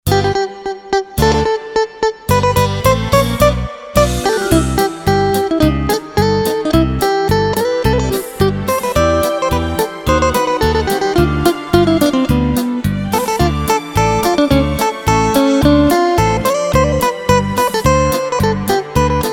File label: Guitar polka